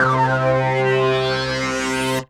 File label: Synth C, Synth Prophet One Shots; Electronisounds